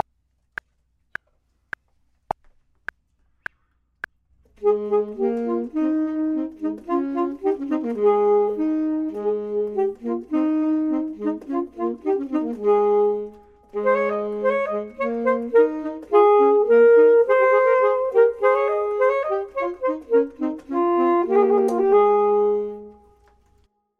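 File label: both, Saxophone and Clarinet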